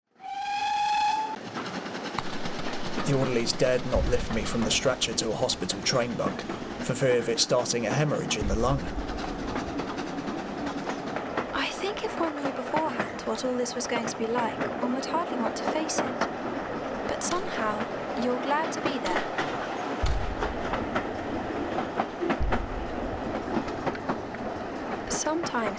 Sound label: Ambulance trains stereo mix